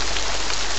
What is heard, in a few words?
rain